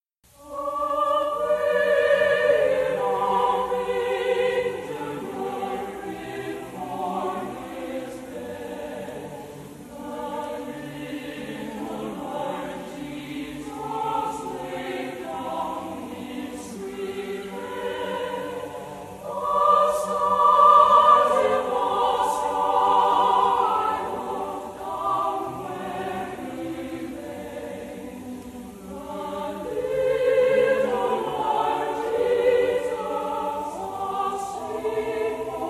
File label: Chorale; Away In A Manger